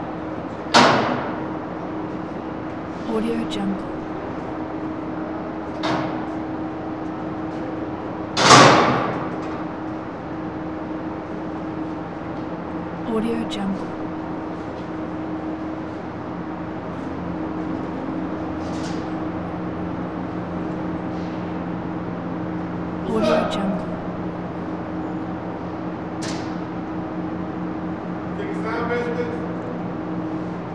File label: bus station